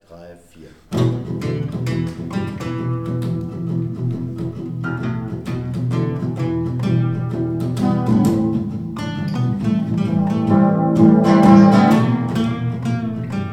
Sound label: Rock Sample